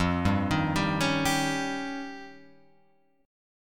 F 7th Suspended 2nd Sharp 5th, Guitar Open F; arpeggiated; chord; F7sus2#5; JGuitar

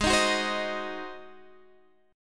G sharp 7th

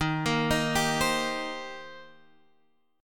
Eb 6th Eb down; Eb6 arpeggiated; JGuitar

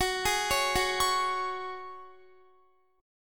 Gb Suspended 2nd, Guitar; Gbsus2 arpeggiated; x,21,18,18,18,20; 4E,4A,5D,5G,5C,6F

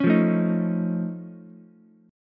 F Augmented 7th F Ukulele, chord; F+7 strummed; JGuitar

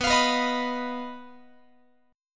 B-Major Flat 5th B down Guitar; strummed; x,19,20,21,19,22

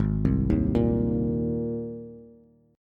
Ab Major Add 9th Ab Bass; Abadd9 arpeggiated; JGuitar

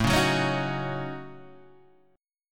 A 6th Flat 5th, Guitar, DADGAD

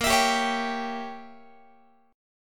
Bb Diminished 7th Bb Guitar; Bbdim7 strummed

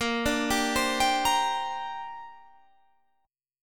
Bb 6th Add 9th Bb Guitar Half Step Down

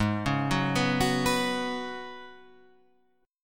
Gb Suspended 2nd Suspended 4th Ab

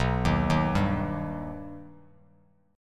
B Augmented B Bass Standard, chord; arpeggiated; JGuitar

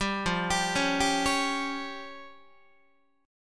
F Suspended 2nd Sharp 5th, Guitar; Fsus2#5 arpeggiated; JGuitar

